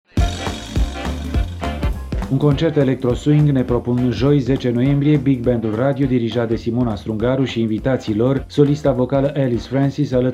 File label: Big Band Minor Swing